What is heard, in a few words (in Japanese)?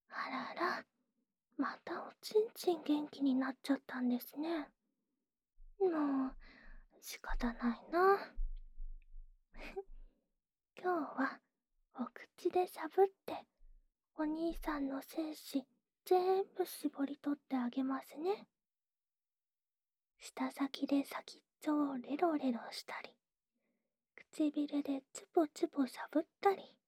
オナサポ用フェラチオループ; 耳かきリフレ『春乃撫子』へようこそ♪～噂の新人キャストは、天才メスガキ! 悶えまくりの耳イキプレイ♪